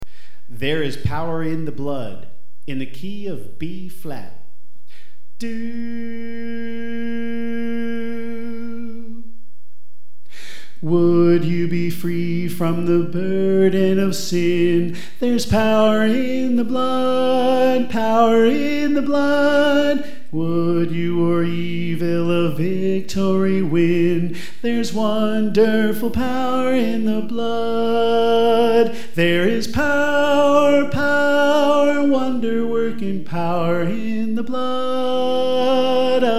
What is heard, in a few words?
Soprano